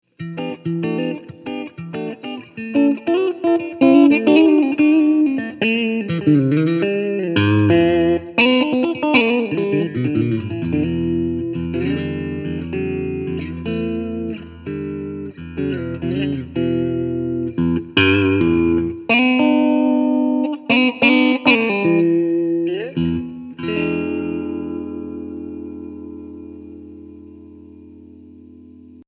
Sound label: Smooth and Classic P90 clean